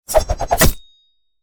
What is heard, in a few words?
knife throw nogore; soundmorph